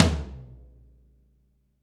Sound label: GRETSCH TOM; ILIO; Double Platinum Drums 1